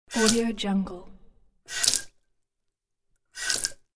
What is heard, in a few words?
very short tap streams